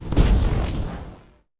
spell shock throw; player

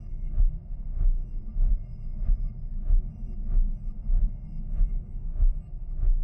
Orbital Hammer Move; TANK; 3Souls

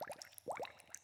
bubbles

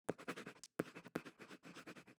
ambience room shadow room working layer

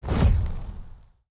Crossbow Fire Choice UI; TANK; 3Souls

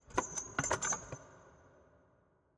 tripalium chain; ambience room torture

